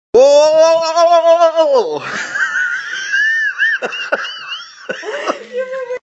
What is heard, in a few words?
wowowo laugh; mccoy to riker